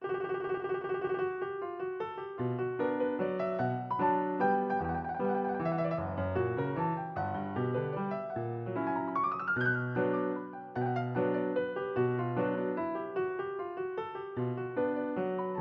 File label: waltz; Annotated Rendering